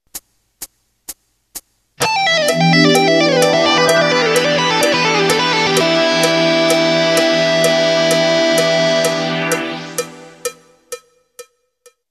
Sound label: wide range penta; lickderwoche